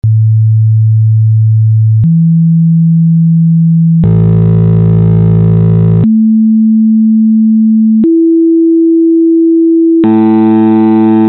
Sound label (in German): quinten gleichstufig; Quinten in verschiedenen Oktavlagen; Psychoakustische Grundlagen der Musik